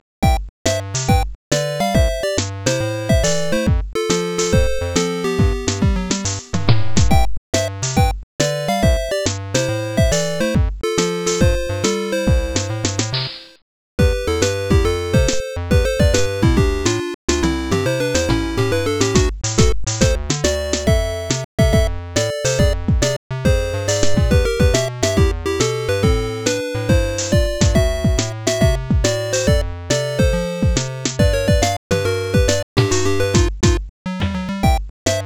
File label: A Short Break